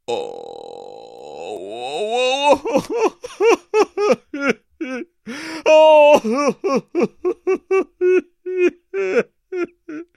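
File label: sounds of male crying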